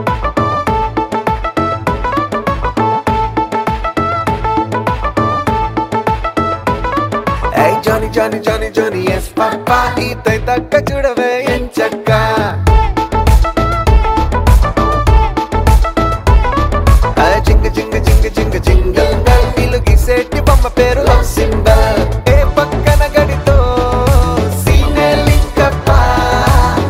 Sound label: theme song ringtone